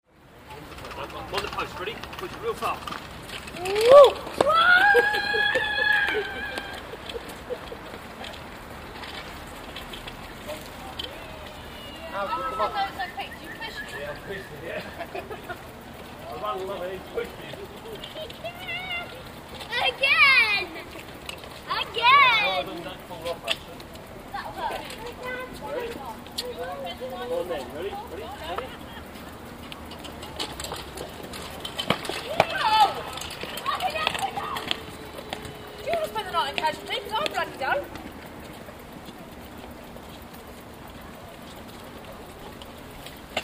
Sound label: beerfestival mum says no